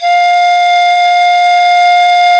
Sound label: FLT, Sicuri Pipe; Club-50 - Foundations Roland; Flutes +